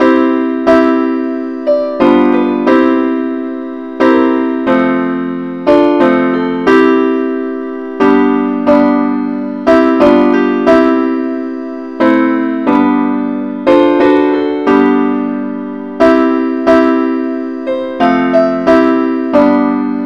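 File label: simple accompaniment, key C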